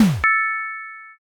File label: defeat